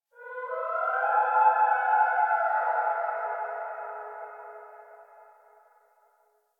Forest Distant Creature Howl; Ambience; Warframe; Grineer Forest

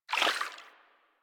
Vial Foley; Warframe; DE; Warframes; Alchemist

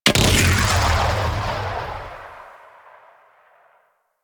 Explosion C; CrpBFG Vandal; Warframe; Corpus

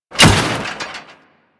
Restrained Foley

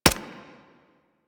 Arch Long Rifle Mech, Weapons; Warframe; Archwing

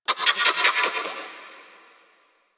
Forest Creature Mid Far; Warframe; Grineer Forest

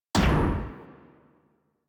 Pulse Laser Mech; Railjack

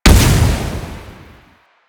Proj Impact; Duviri; Incarnon Adapters; Incarnon Dera Vandal; Weapons

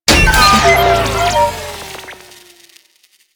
Jackal Stun Deactivate; Warframe; Jackal Bursa

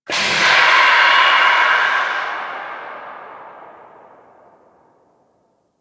Terraform Machine Gas Burst Distant A; Asteriod Transition

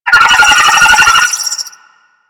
Space Drone Attack A; Warframe; Corpus Space Drone

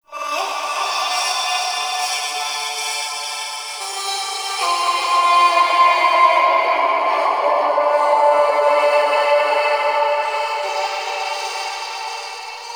Energy Thread Music; Warframe; Apostasy

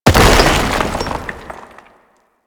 Destructible Rock Cover; Objects

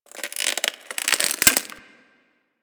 Ocean Glass Crack Small; Ambience; Warframe; Grineer Ocean

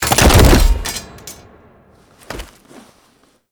Fall; Quests; The New War; Throne Room; Teshin

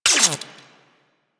Quill Dart Gun Electric Mech; Warframe

